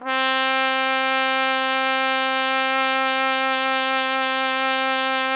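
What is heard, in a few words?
trumpet; Roland - LA Composer vol. 3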